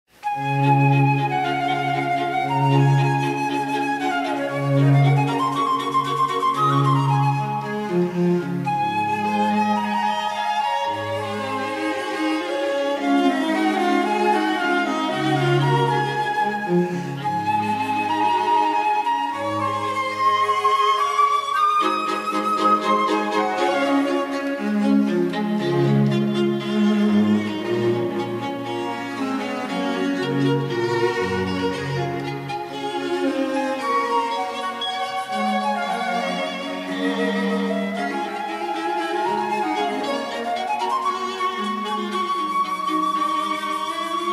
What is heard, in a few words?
flute Quartet D